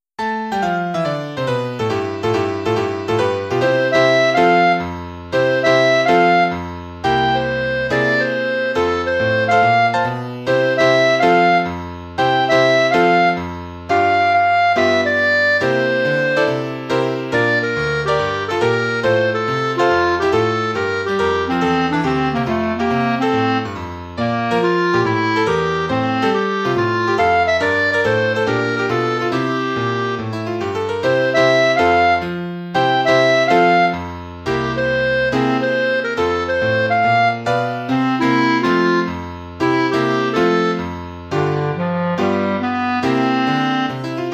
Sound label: Easy Swing Clarinette